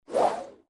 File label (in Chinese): 万能卡飞下